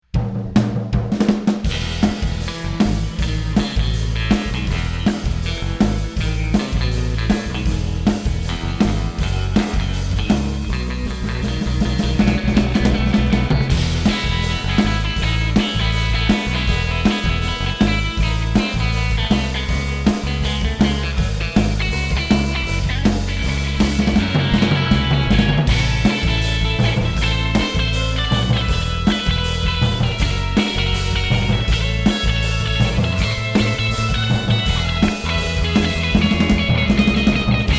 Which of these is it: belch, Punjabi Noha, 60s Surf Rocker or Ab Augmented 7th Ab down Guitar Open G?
60s Surf Rocker